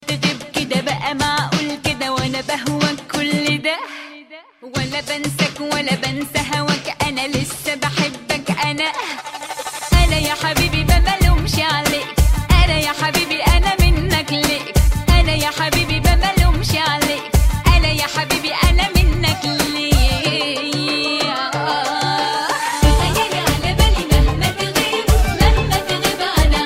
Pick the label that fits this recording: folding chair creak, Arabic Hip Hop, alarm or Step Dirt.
Arabic Hip Hop